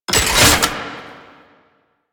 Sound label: Rocket Launcher Reload; Weapons; DE; Warframe; Tenno; Lasrian